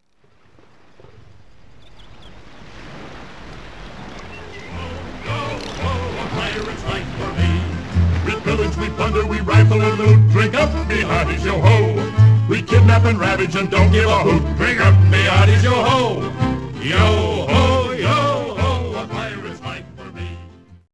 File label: yohoho; fleetworld